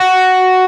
arp; osu

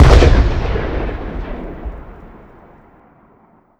Boom Embers

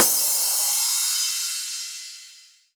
normal hit finish

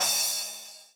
Crash short

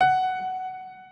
Piano F#4

